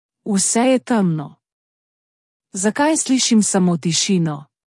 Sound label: breathing; novafarma; intro final